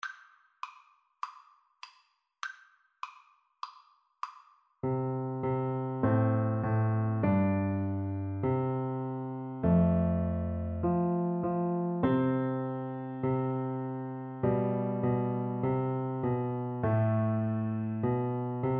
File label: piano duet